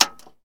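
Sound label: quiet sound of hitting tin can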